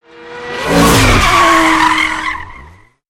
Cars Skid Around

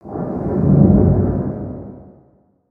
Cave Ground Rumbles; ninjago

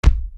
Gorilla Chest Hit; creatures organic

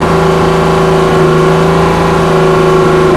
LOOP, vehicles; aronwk collection; ndaudio

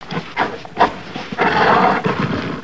Gorilla Breathing Mad; creatures organic

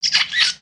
Pet Bunny Beg; creatures pets; Lego

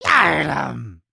Attack, creatures organic

